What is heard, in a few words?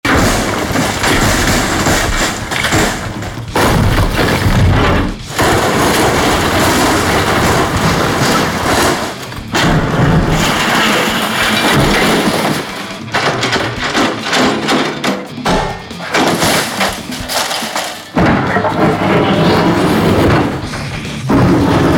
Demolition; TPMG